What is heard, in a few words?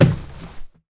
escalier fragment stairs